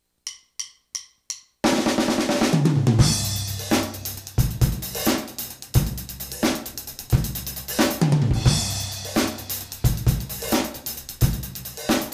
Rock Shuffle, 175 BPM, Up-tempo, 12/8, rock-style shuffle jam.; drum